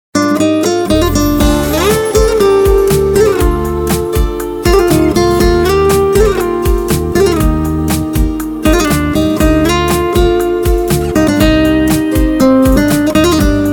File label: Bikalam Guitar